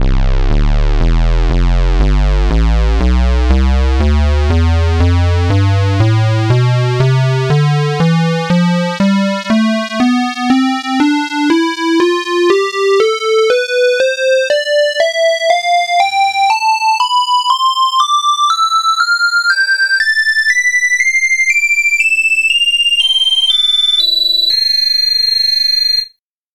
square lead tonleiter

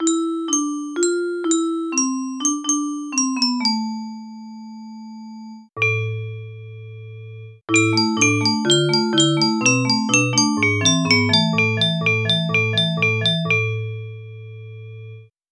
music box samples

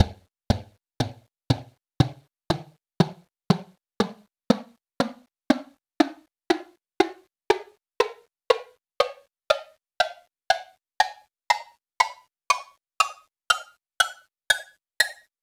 woodblock tonleiter

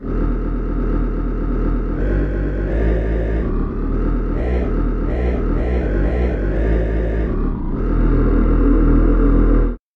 echo drops bass

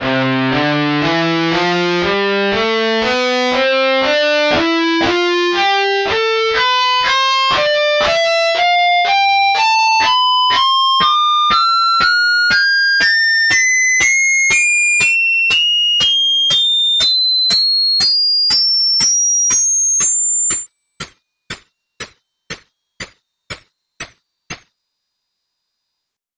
guitar harmonics tonleiter

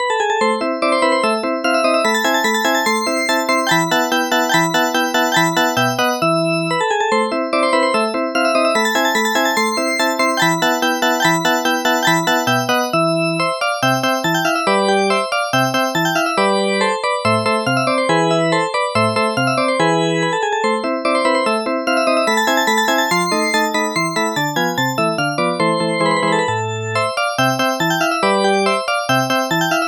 drawbar organ

